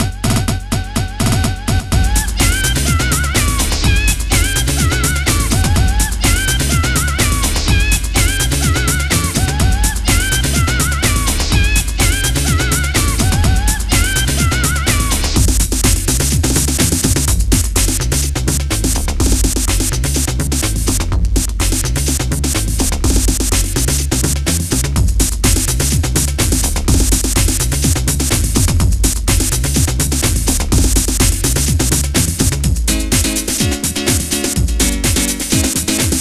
amiga housemix

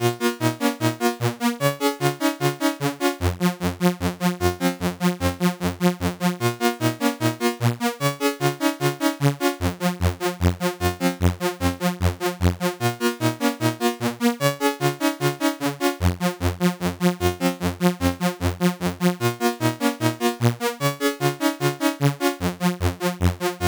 c64 in game; lazy jones

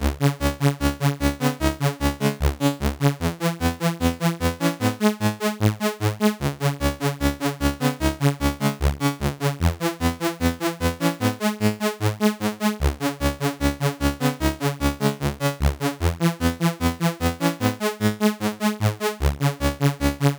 c64 in game; lazy jones

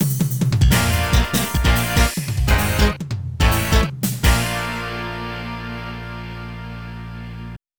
game over; arcade; ridge racer

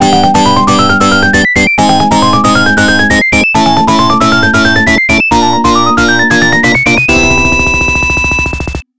arcade continue; bionic commando

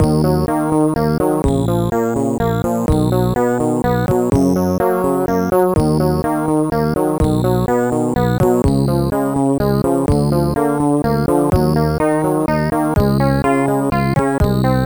amiga in game; turrican ii

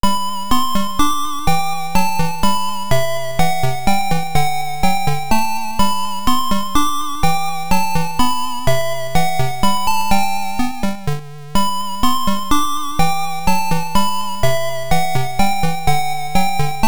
atari game end

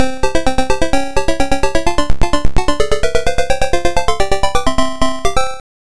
arcade start; son son

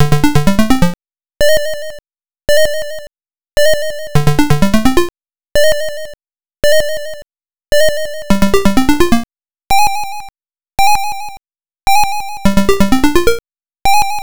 underground cave, msx; goonies

